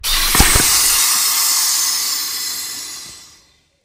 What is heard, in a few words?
taking off a space helmet